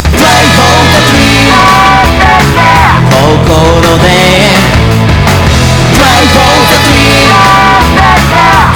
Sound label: cry